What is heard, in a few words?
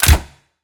xbow fire; weapons